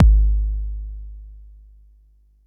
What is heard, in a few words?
Bassdrum, Jomox Xbase09